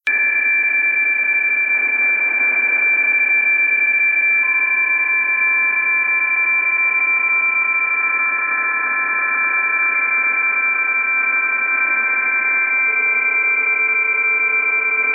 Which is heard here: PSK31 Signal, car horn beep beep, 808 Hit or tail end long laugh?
PSK31 Signal